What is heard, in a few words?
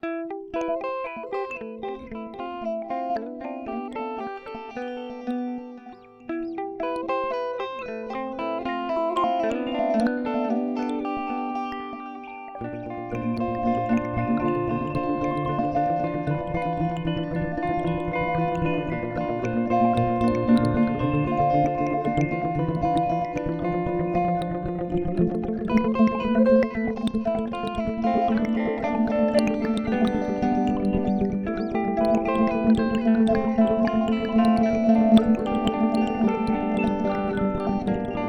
Mooer D7 X2 Mod Phaser